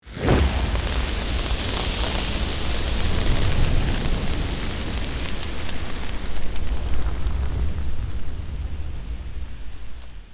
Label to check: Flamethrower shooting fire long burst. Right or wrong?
Right